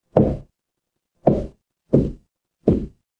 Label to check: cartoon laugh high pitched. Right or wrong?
Wrong